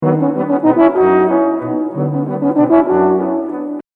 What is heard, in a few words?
Waltz; Brahms